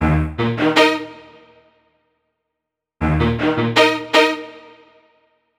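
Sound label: Hip Hop Strings, Ebmaj, 080 bpm; Pro Tools Essential Loops; Digidesign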